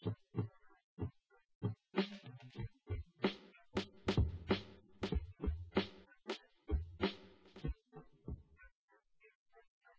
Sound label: wdrums inst sim